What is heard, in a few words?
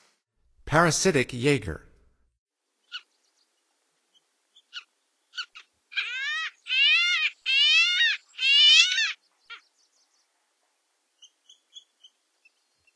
Parasitic Jaeger; North America; Stokes